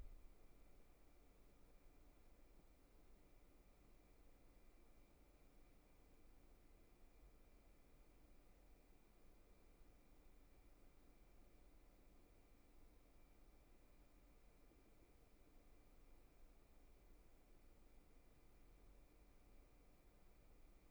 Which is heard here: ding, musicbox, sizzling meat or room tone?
room tone